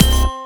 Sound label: stop bonus; Amatic; Fruit Box